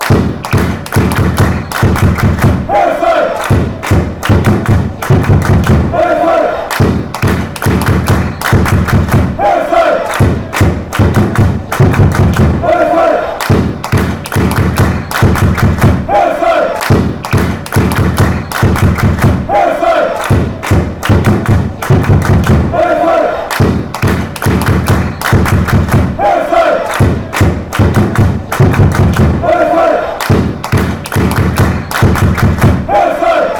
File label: drums, chants; heerenveen